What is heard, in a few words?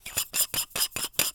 gun silencer barrel screw fast; sonniss gdc